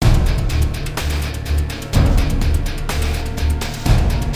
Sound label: Medium Music; Alien Breed Trilogy; PS3; Xbox 360